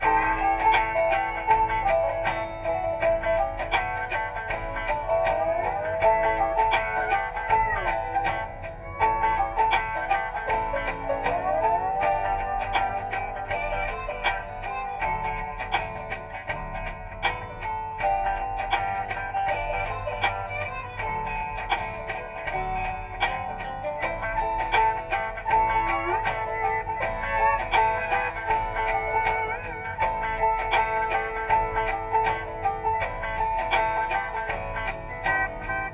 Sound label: radio country; Spider-Man 2 The Game